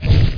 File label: bam; THE REALM